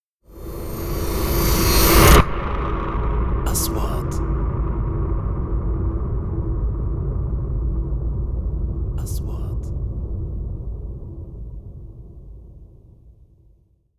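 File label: 3D SFX Trailer; مؤثرات تشويقية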